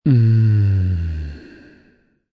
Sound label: Mm Pleasure M, Reaction, male; Exclamation